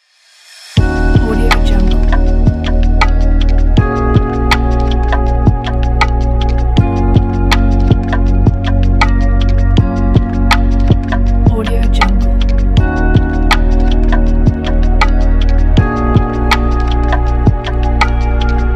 autumn lounge hiphop

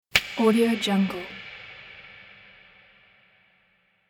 shot

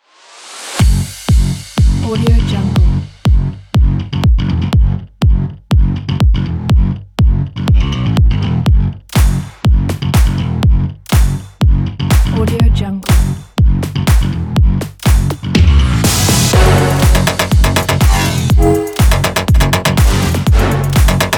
electro house

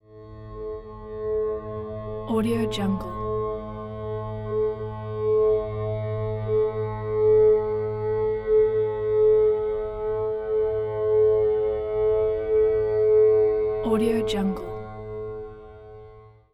ambient drone

